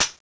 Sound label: foley; oicw; cstrike